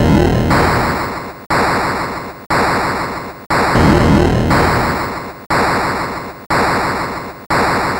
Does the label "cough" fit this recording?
No